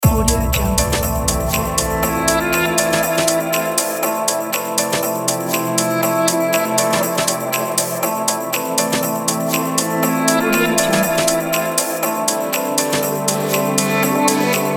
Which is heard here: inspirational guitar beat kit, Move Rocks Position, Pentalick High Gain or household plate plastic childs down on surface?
inspirational guitar beat kit